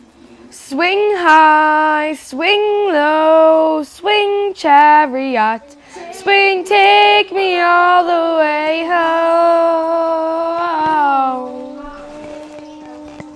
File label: swing